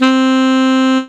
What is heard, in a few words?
Alto Sax, Digital Synths; Ableton Foundation FreePacks